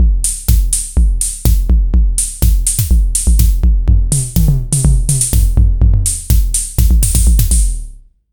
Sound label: TR-909; hex drum; user patches